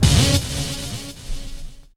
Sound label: noise, FX & ATMO, ALARMS & ALERTS; Techno Trance Essentials